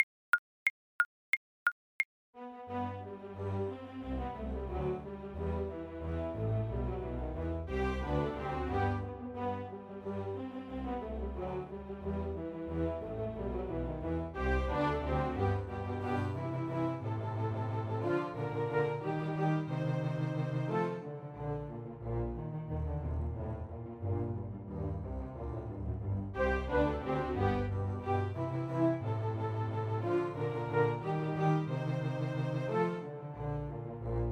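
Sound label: CELLO karaoke; Mozart Musical Joke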